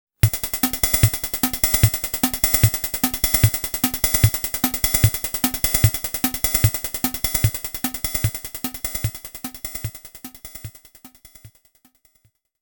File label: FM Sound - Test BGM; Monster World Complete Collection; Monster World II